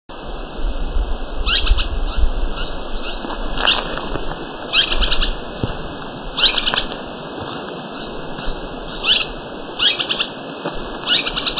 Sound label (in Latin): Furnarius cristatus; PP Chancani